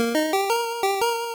Fanfare; Arch Rivals; Game Gear

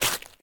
Step Ice

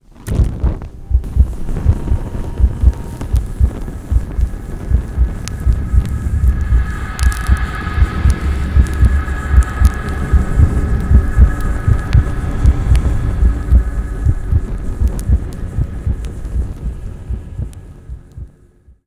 combust buildup

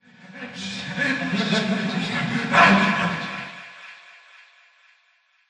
Voices Ambient

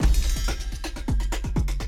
Combat Start; bolt 2008 pc gamerip

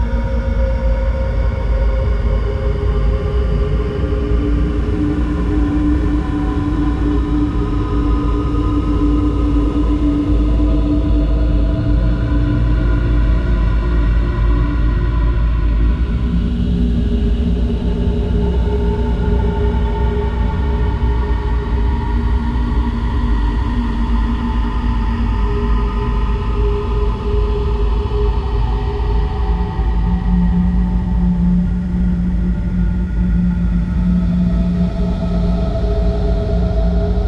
Drone; Assassin's Creed 2

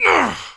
Monster Human Death; Inotia 3

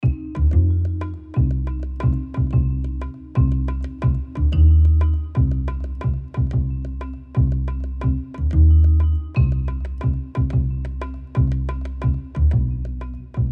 Music Loop 8 Bars; Agent A A Puzzle in Disguise; Windows

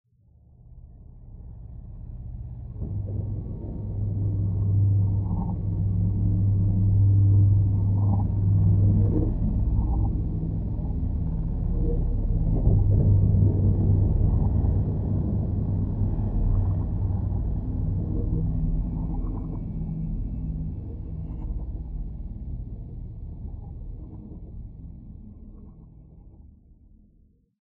Ambiance Flooded Pad

